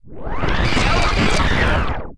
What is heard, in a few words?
citadel core data rewind; hl2 ep1; ambient, levels, citadel